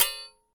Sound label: shield metal, attack impact